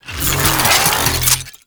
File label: Short Bow Charge; Warframe; Duviri; Dax; Weapons